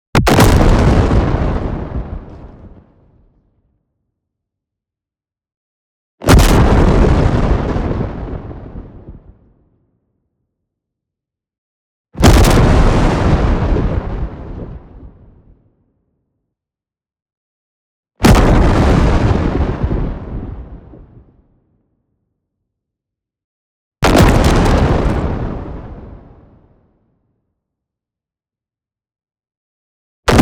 EXPLOSION LONG Earth Crumble; boom library; Anime Essentials

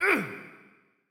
Cant Attack

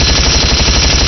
Assault Rifle Shot Loop; Weapons

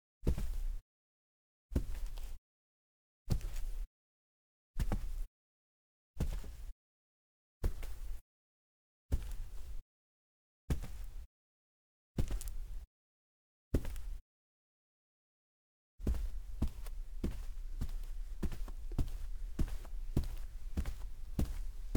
Carpet, Foley Footsteps; Sound Ideas

